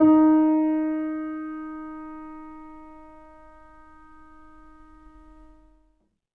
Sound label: R, Acoustic Piano, PIANO STEREO; InVision Interactive; Miroslav Vitous